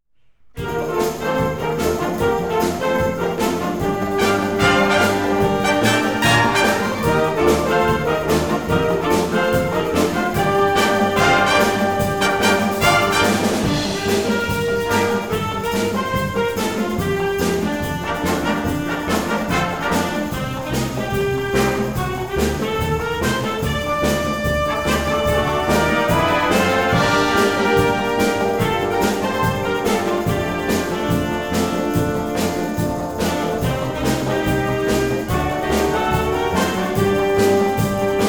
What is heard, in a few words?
IN BRASS; game music; super depth